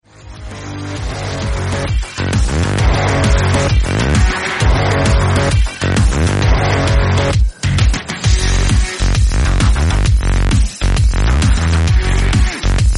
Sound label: players instrumental